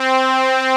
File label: PWM C3; Analogon